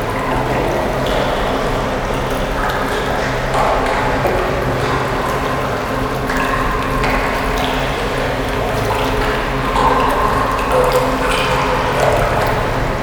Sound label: Pellet Room Amb Loop; moul